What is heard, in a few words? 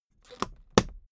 suitcase close latch lock hard